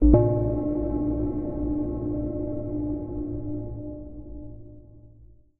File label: editor blips; Source Engine; Portal 2